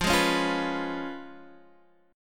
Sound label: C sharp 7th F, chord